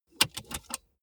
car bmw e46 interior safety belt clasp release

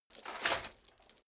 metal chain movement on plate single

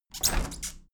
window handle plastic squeak open heavy; objekte; fenster

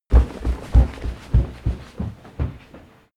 step stair runner

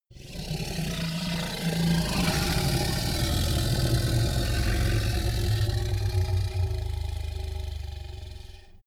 minotaur grunt long; kreaturen

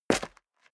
step dress boots concrete grit single left; Footsteps; schritte

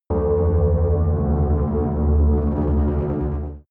extrinsic drone, energie

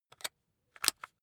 rock drill head attach regular; bohrer; soundpool

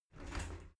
shower slide door slide short close

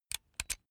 electric drill bit insert; maschinen; bohrer; soundpool

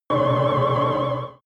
extrinsic siren, energie

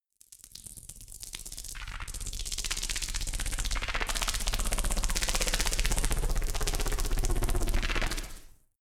organic scuttle long, sciene fiction

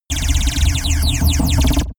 space laser blaster cannon; krieg-waffen; soundpool

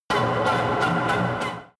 drone wiggle; energie

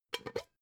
pot lid open; objekte; topf

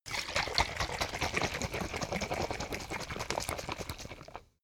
bottle vodka shake foam; berufe; gastronomie

